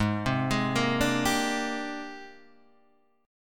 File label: G sharp Major Sharp 11th, Guitar Half Step Down